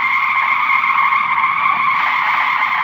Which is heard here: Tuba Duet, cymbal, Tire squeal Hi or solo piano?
Tire squeal Hi